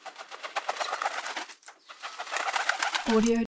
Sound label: poultry chicken; soundbits-soundfx